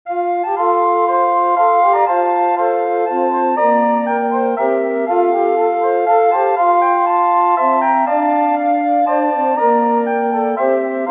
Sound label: Recorder Quartet; Sans Day; Bill Gassman